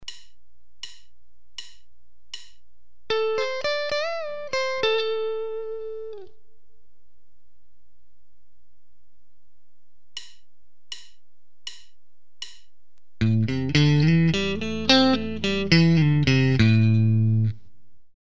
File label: Box IV riff with triplet feel, Box V lick, guitar